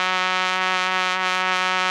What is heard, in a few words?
trumpet tuned trumpet f 2